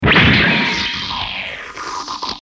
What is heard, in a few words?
mech slashed